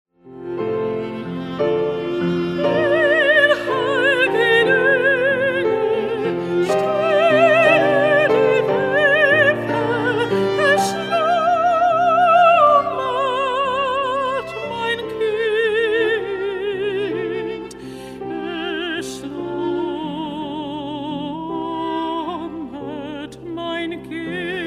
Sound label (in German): Geistliches Wiegenlied; Zwei Gsange, Op. 91, No. 2; sacred classics